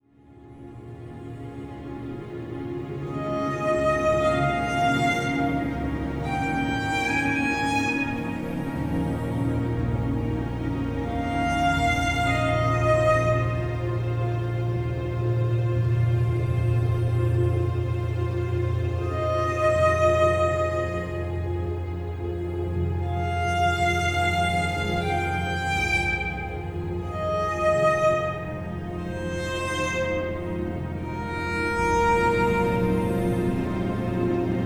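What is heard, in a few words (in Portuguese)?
Relaxamento Soundscape Massagem, Música de Meditação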